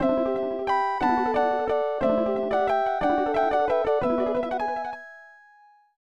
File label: Unknown Jingle; Sword World SFC; SNES